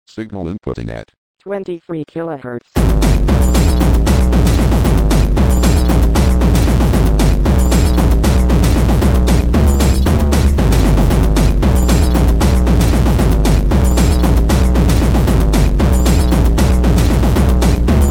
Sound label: 23khz bass